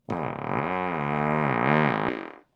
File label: fart